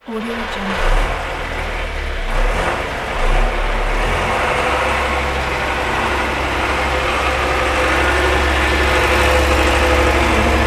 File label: tractor